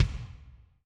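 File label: Bright Hall, Alesis SR16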